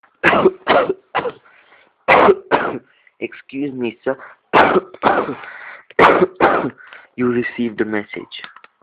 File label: Cough Tone